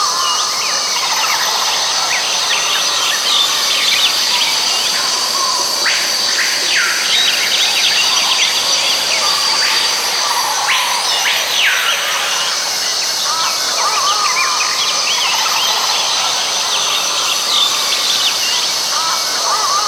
Exterior Amb Loop

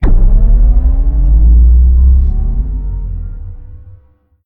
Pod Power Up; moul-assets

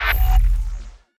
Open Close; moul

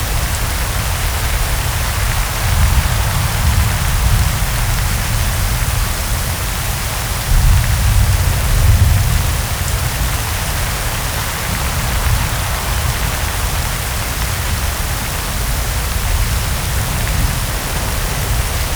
Desert Rain

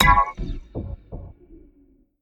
Marker Pickup; moul

